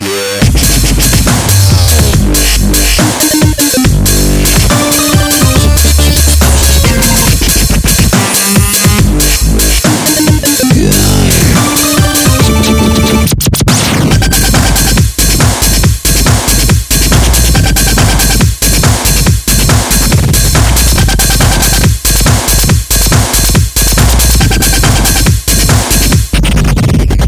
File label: electro HC